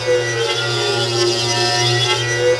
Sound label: stap steady, vehicles